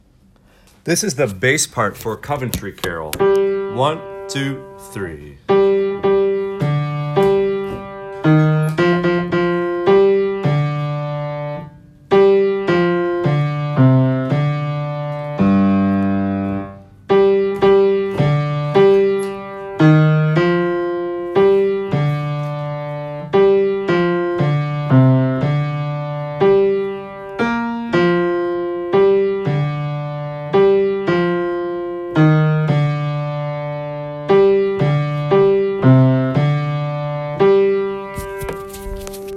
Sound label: bass, choir; coventry